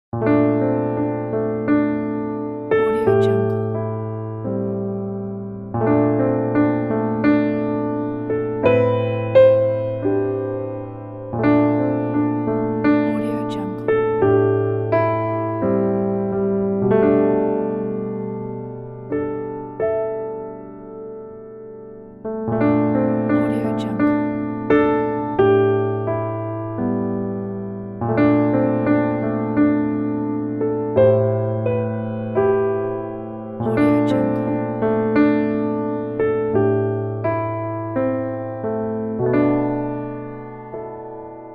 piano of sad and hard feeling

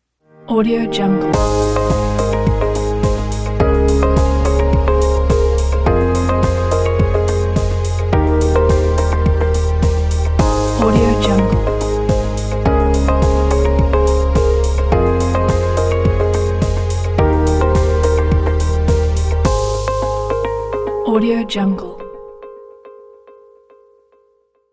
motivation logo

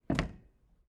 door light bangs

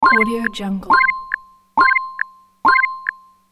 game wait timer loop

